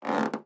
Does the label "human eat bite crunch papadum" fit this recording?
No